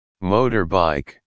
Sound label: motorbike